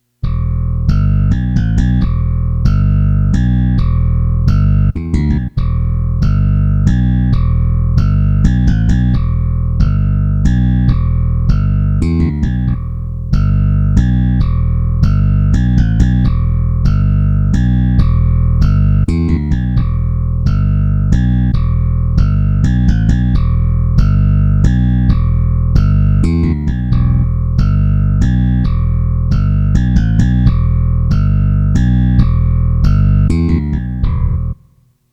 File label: bass